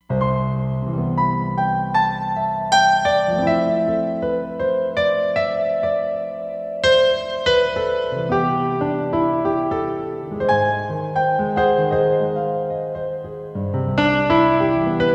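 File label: Abandoned Piano, Roland Fantom XR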